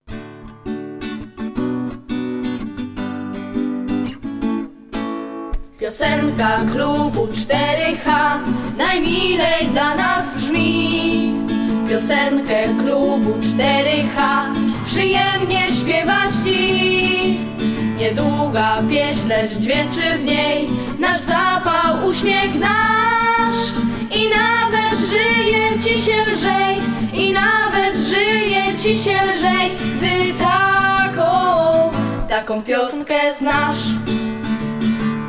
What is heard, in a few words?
hymn; klubu 4H